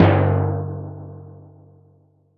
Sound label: Timpani, Percussion